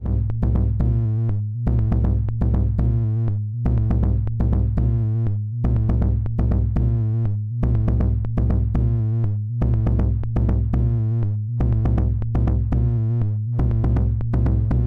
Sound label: KICK ME PITCH CV; Snazzy FX